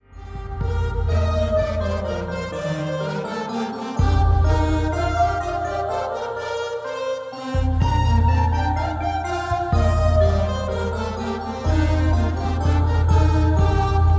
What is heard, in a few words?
Uranian Saraband